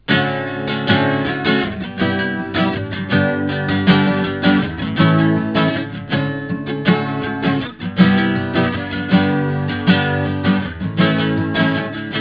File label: Country